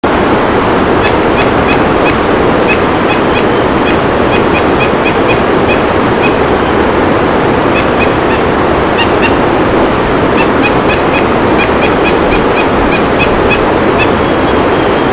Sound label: grey breasted mountain toucan